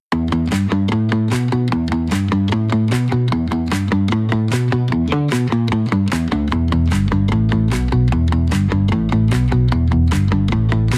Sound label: Pop